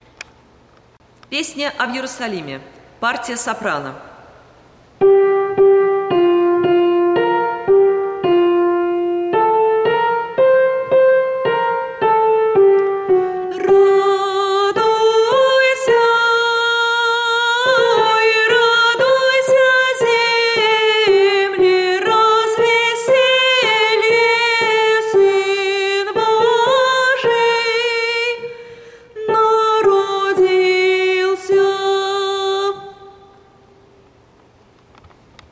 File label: soprano; A v yerusalime